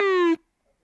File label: Drop Hi Speaker, Stylophone Beatbox